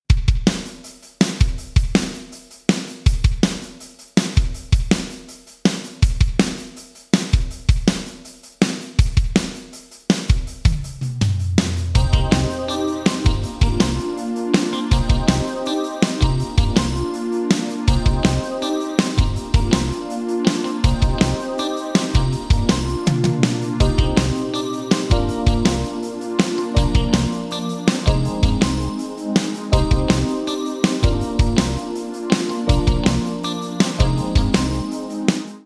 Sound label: Drums Roland SRV 3030 Medium Wood Room Vintage Digital